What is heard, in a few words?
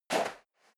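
step leather shoes sand single right, footsteps; schritte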